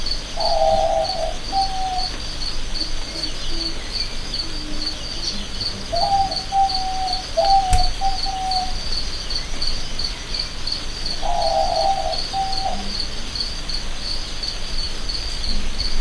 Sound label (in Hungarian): valamilyen gerle takarásból, terrárium; Győri Zoo 2014